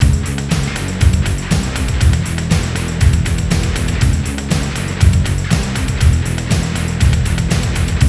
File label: FAT ROCK; Best Service Advanced Media Trax Building Blocks; FAT ROCKA